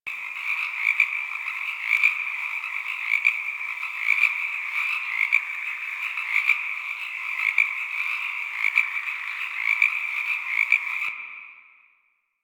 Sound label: Night Frogs Loop; Ambience; Props; Loops; Warframe; Eidolon Plains